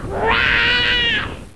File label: boo roar